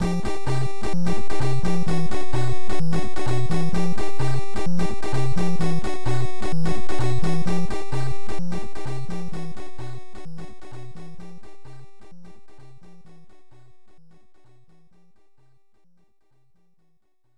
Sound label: Alert; simcity; nes